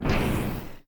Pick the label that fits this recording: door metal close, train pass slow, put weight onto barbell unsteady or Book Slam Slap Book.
door metal close